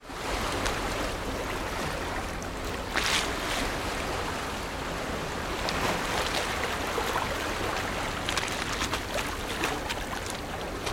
water ocean waves break onto and over partly submerged harbour wide jetty